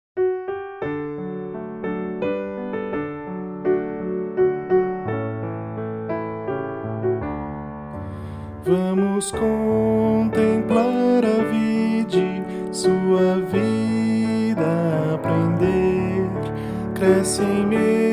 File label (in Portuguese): voz piano